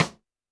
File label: Snare, Roland TD-20